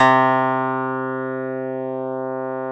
CLAV C

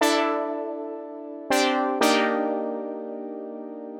120 Pad Chords C, Keys, Nu Jazz House; PowerFX

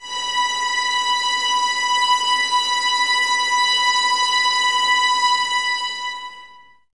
Violins Sul Ponte, String Ensembles; Miroslav Vitous; 11 Violins

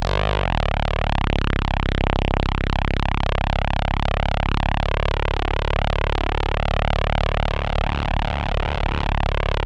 C1, Synth Line; 90 s Sample CDs; NiceBeats; SynthLine VOL-24

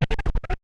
scratch; Roland MC-909

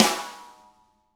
DW RIM, Drums

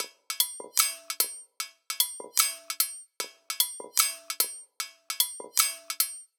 Kitchen Foley 150BPM, drums; Antidote Advent; Kitchen Foley Sounds & Loops

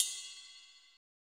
ride cymb; Giga Samples Collection; RY30

